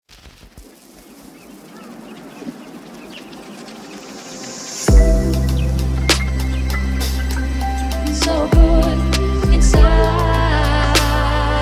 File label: Slow down Lofi Hip Hop